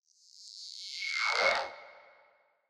Spawn Zone Tear

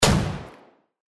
boom

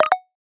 canceled

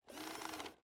count up

